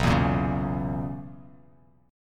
B Major 7th Suspended 2nd Suspended 4th B down, chord shape; BM7sus2sus4; strummed; JGuitar